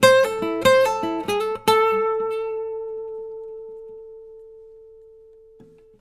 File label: licks, Blues Guitar; ILIO; Fretworks